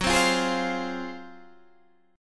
F Minor F sharp, Fm/F# chord; strummed; JGuitar

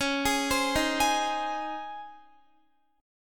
Db Major 7th Suspended 2nd, Guitar; x,16,18,17,15,15; 4E,4A,5D,5G,5C,6F; arpeggiated; chord shape

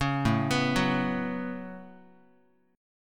A 6th Add 9th A down Guitar; A6add9; arpeggiated

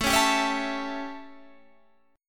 A 7th A, Guitar Drop D

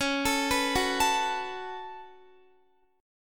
Db 7th Suspended 4th Sharp 5th Db down Guitar; arpeggiated

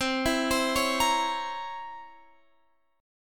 C 7th Flat 9th C Guitar Open F; C7b9 arpeggiated; x,19,16,19,16,17

